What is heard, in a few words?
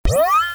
Heal Up